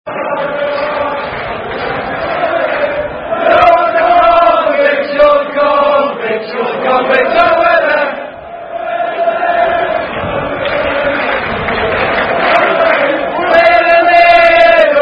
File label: Barmy Army Sings; The Convict Song